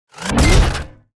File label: robo wolf